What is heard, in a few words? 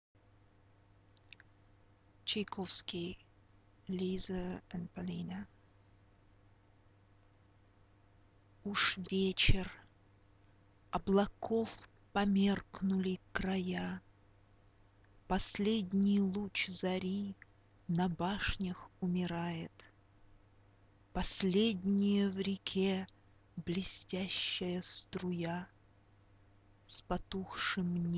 duet; Tchaokivsky